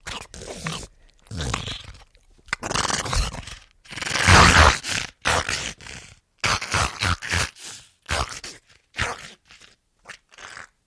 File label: Pit Bull Breath Snarl